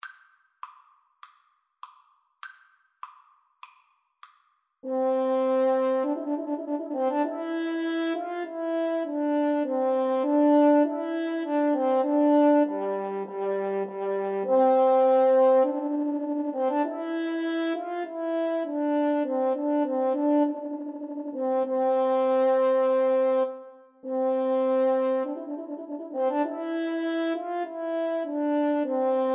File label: trumpet voluntary, French Horn Duet